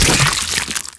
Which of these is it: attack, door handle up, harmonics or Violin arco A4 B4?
attack